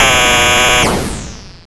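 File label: FX left, attacks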